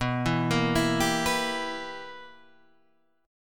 B Major 7th Suspended 4th Sharp 5th Guitar Half Step Down, chord